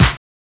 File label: Snare, Drum and Bass